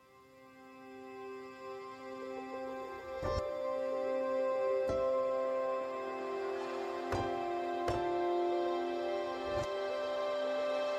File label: Music White Waves, Meditation